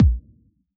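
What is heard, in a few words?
bass drum T9, Yamaha DJX; 155 DJX Kit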